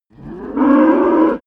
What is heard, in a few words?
cow moo single distant